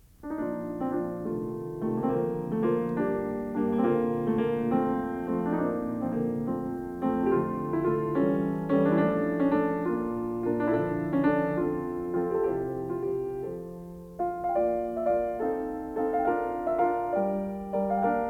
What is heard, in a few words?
Variations and Fugue on a Theme of Handel, for piano, in B flat major; Brahms